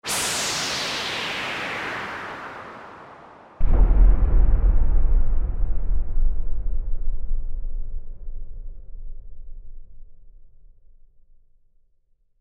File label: Meteor, Futuristic Whoosh sound of a meteor with explosion.; Bombs; Explosions; Meteor Impact